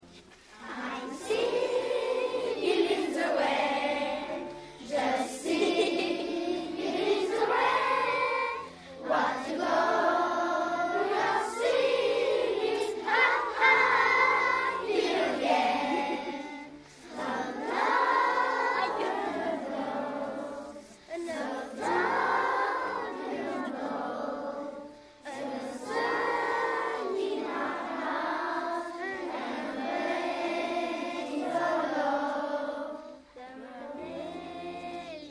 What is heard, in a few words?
singing rain